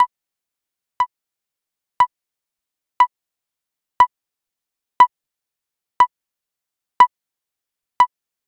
bassy raspy quiet, creepy

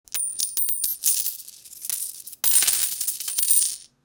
collect sound for video g, coins

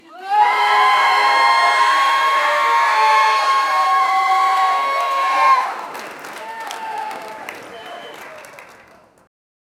heavy distant applause, cheering

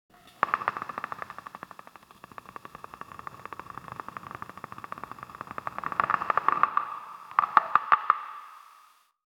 a phonk typa dungeon skel

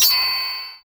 sound effect, block

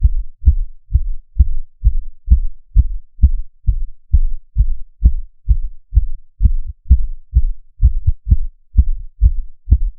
heartbeat very low muffle

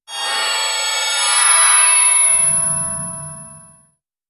a smooth technological sound, business

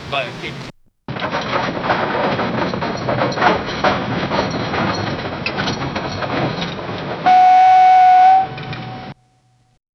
concrete creaks suddenly, car alarm

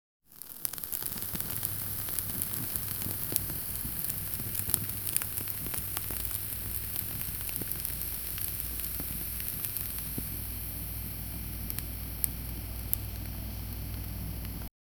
the sound of spreading ch, brush

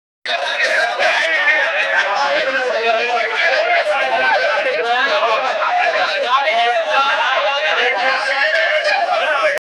bar chatter

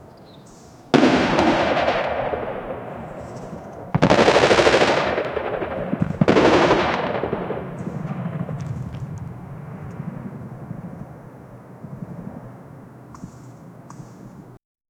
several fighters can be h, bombing

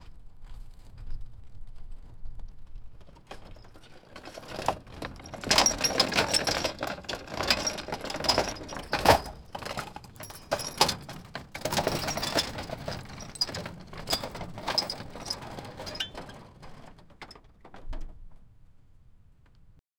small cart with small met, dragging

